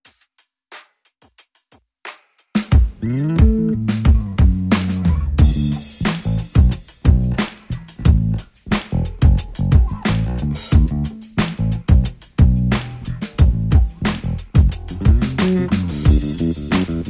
Drum and Bass

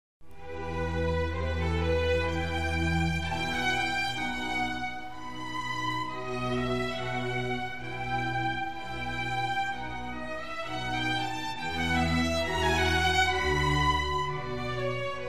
Symphony for Strings in E major; RV131; Antonio Vivaldi

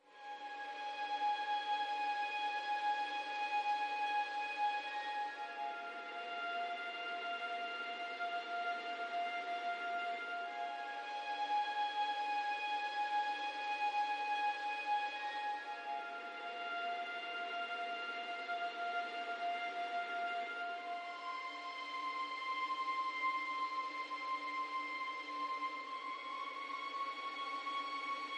Legato Strings Mix

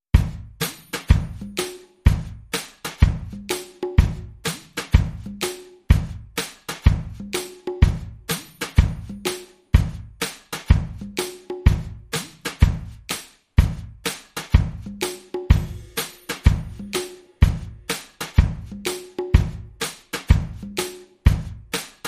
Rhythm Only

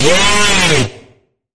Sharp Bend, G sharp; Sharp Bend Ahead

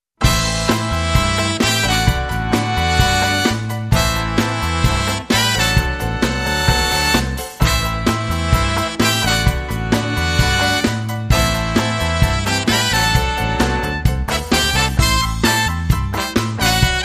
Rock Brass

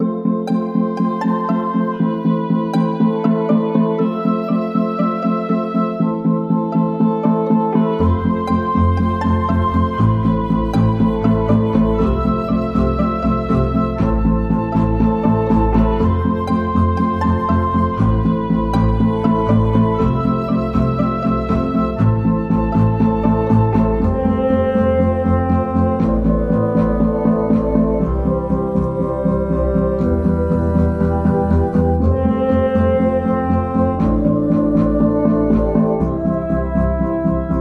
No Sample No Percussion

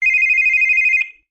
Phone Ringer

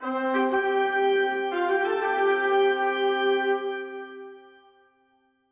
Fanfare Short

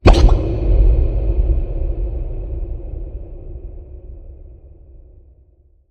Blood Bubble Boom

